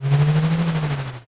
growl

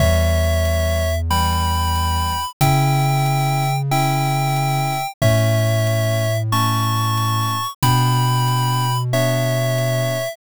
bgm mellow

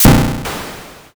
thunder magic

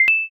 ic touch

